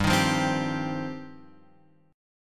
G 7th Suspended 4th Sharp 5th G down Guitar Open F; G7sus4#5 strummed